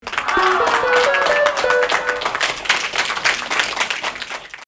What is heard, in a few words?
game over